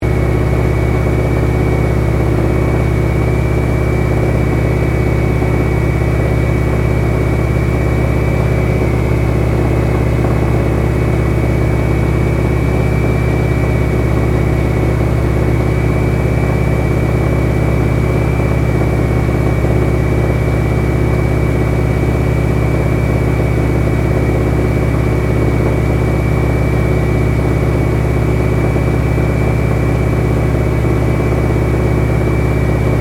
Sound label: AIRCRAFT AT-6D HARVARD MK III FLYING INTERIOR; Harvard Training Aricraft; Airplanes (Sound Effects:Vehicles)